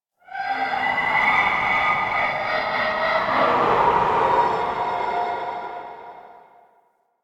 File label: Void Connector Shriek One Off, Ambience